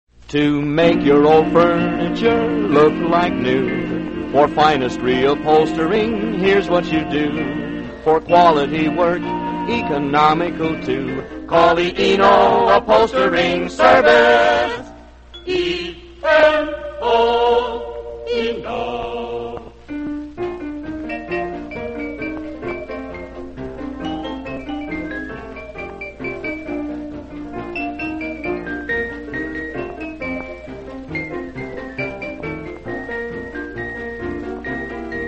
radio jingles; eno upholstery; allied radio artists

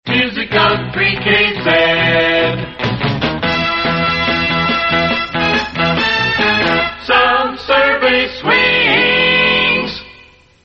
sound survey swings; australian otr promos and idents; 3kz

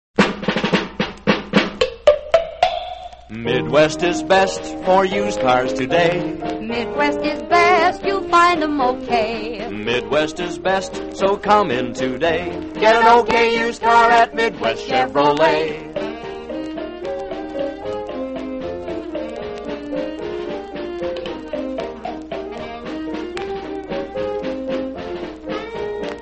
radio jingles; midwest chev; allied radio artists

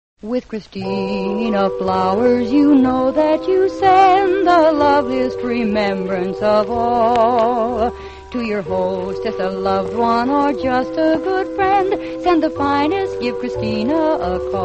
radio jingles; christina flowers; allied radio artists

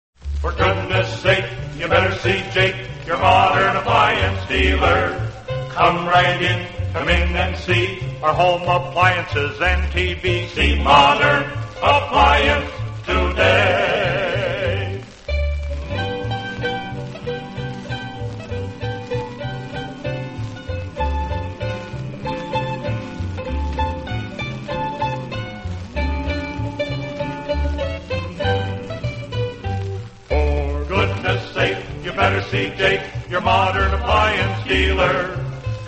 radio jingles; modern appliance; allied radio artists

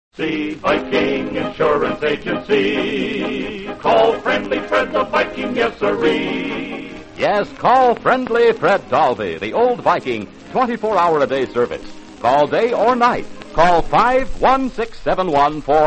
radio jingles; viking insurance; allied radio artists

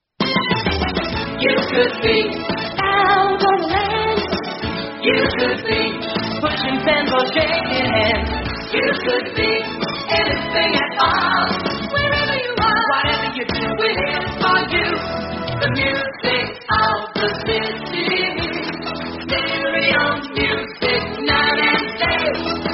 jingle; australian radio 3ba

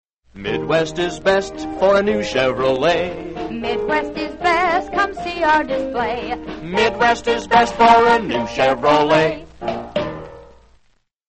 radio jingles; midwest chev; allied radio artists

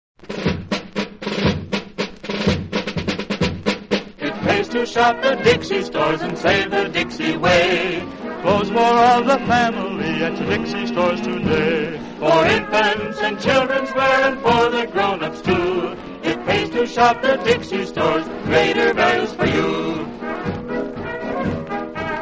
radio jingles; dixie stores; allied radio artists

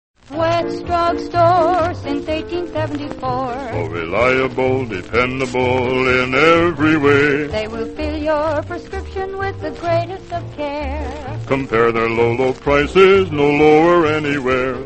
radio jingles; wetts drug store; allied radio artists

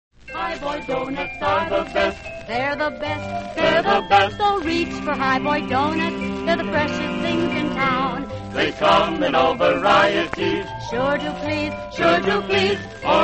radio jingles; high boy donuts; allied radio artists

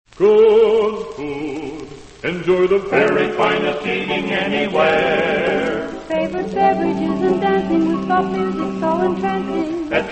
radio jingles; jerrys supper club; allied radio artists

